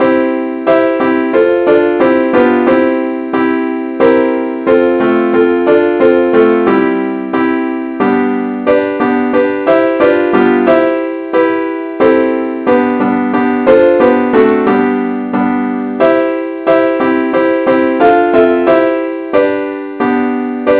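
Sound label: advance accompaniment key C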